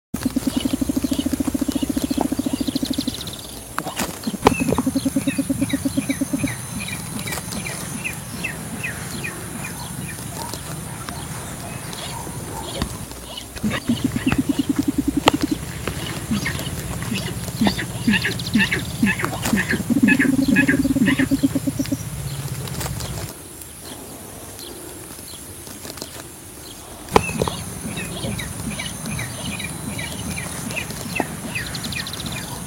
Canto do saracura carijo

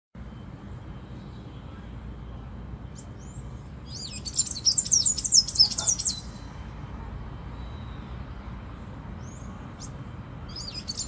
Canto do sanhacu verde

